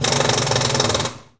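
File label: Impact small, air tools, industrial; Air toolks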